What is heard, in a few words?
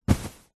fabric bag soft full of clothes grab; zapsplat